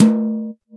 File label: Yamaha CS6, Drums